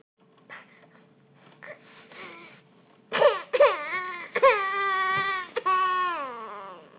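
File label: baby hungry, female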